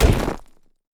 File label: yeti vs stone; nunu